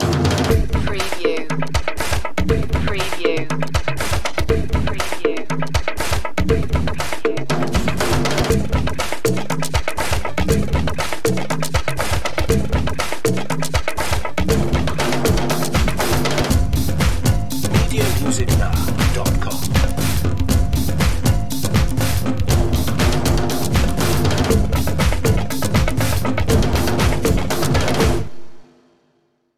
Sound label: afrika call